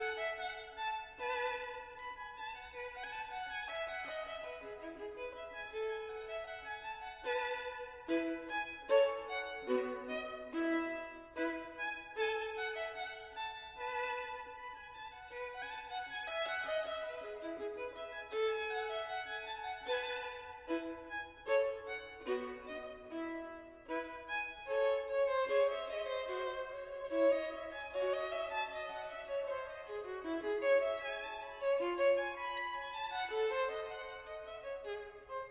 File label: Gavotte en rondeau, Violin; Bach, Johann Sebastian